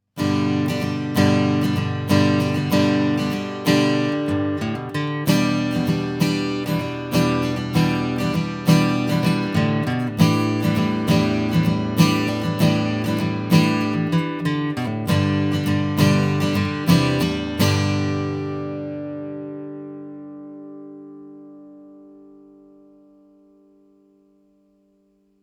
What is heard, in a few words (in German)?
Akustikgitarre; Austrian Audio OC7